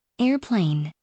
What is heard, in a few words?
airplane